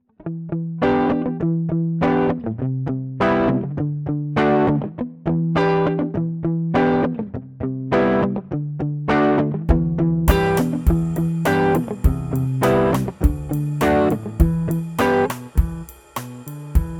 pop; digitech trio

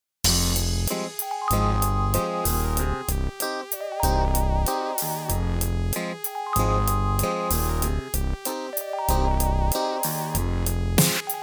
hip hop; brainworx bx console; 18 instanzen in stereo

